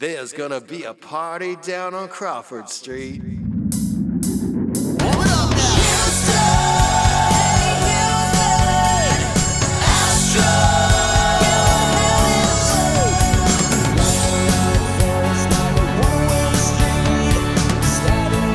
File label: acoustic full mix